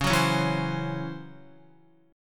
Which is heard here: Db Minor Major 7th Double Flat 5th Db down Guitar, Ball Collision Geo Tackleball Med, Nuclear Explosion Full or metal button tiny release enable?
Db Minor Major 7th Double Flat 5th Db down Guitar